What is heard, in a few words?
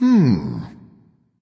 thinking; chaac